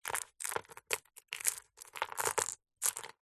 foley gemstones several small opal amethyst jade short movement handling on surface